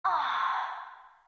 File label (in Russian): ston ah korotkiy chuvstvennyy zvuk